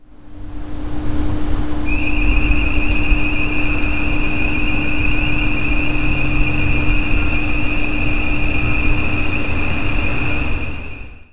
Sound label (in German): muellauto rueckwaerts